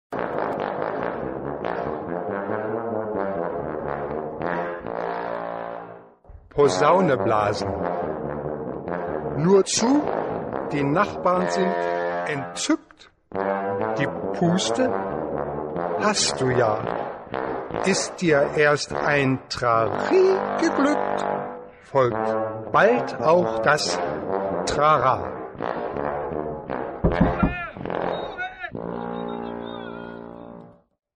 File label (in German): posaune blasen